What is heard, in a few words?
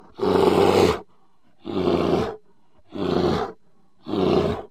bear eat